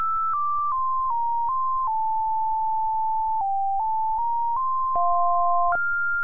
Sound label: melody